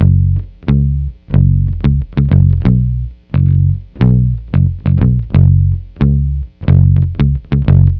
Lounge Bass-F#; Zero-G Kocktail Kollection; Construction Kitz (Lounge); Welk Lounge 090

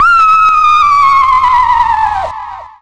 Whinny; Total Drum&Bass

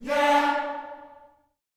"YEAH" D, Gospel; ILIO; Vocal Planet

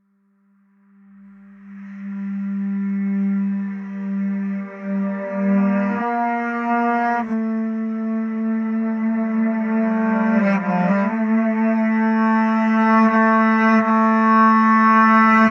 Baritone Effect, sax; Sonic Foundry (Sony Creative Software); Jazz Solos and Sections; Effected Sax

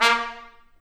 BRS TP STA0N, Stabs; Roland L-CD702

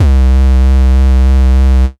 C Bass

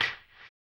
HAMBONE; Blues & Soul

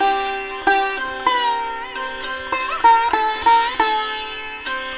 SITAR, Indian Traditions

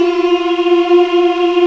VOX AFMM, VOX Afro Mms, Africa

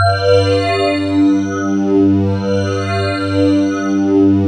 KORG WAVESTATION; Rubber Chicken Software Sample Bank

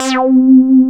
BASS C2, Analogon

